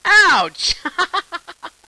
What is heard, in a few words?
hit